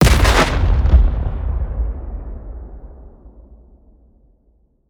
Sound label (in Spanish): disparo destructor